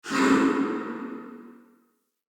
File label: Ghost Soldier Attack, Vocals